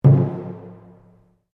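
Drum Taiko Barrel mezzo forte, Japanese Taiko Drum; Miscellaneous Musical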